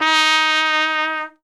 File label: SOLI TRP, Alpine Volksmusik TROMPETEN; Best Service